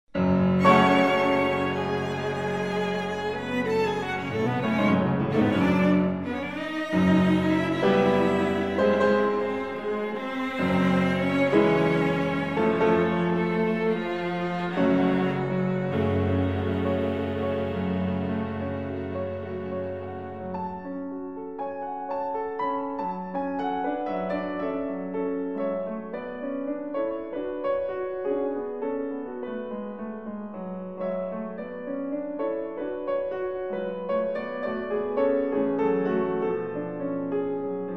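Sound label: piano quartet; bridge; phantasy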